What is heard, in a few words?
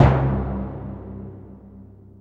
sfx perc